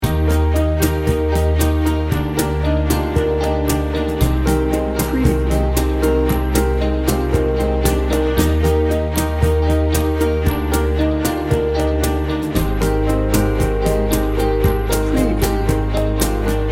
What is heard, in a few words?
motivating and positive loop